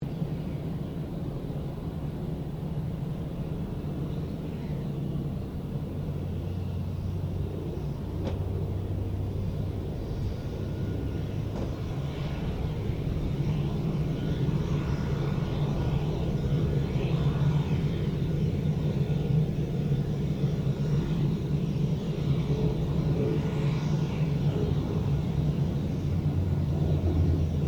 AIRCRAFT PC-9A TAXI SHUTDOWN DISTANT, PC-9 Military Trainer; Airplanes